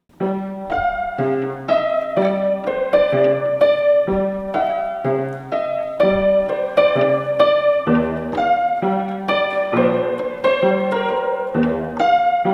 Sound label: Strange Hall Piano